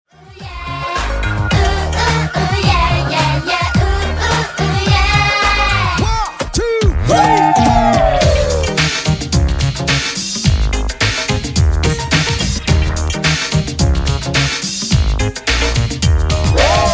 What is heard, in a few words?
I Love Sunshine Karaoke